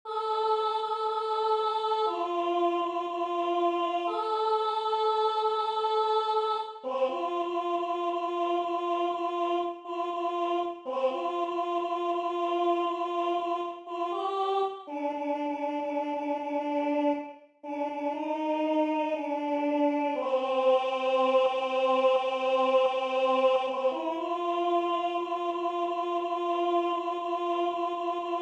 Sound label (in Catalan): Contralts